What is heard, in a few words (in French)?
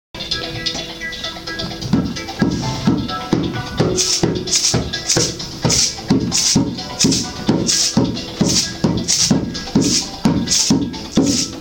jingle; mme zorro et mr bidon